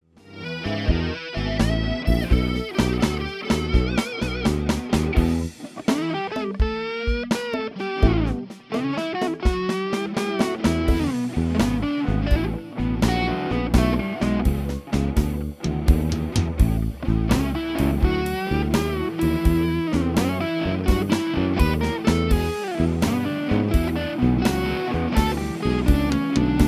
BT Blues lead

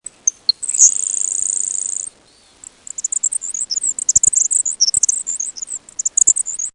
Sparrow Grassh

